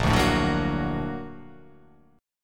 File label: A sharp Augmented Major 7th A sharp, guitar